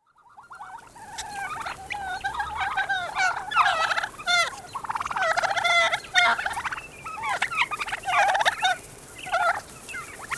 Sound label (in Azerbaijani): hindushka sesleri; et hinduska